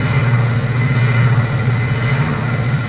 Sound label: homing shell motor; Barotraumatic; Deadnoughthomingshell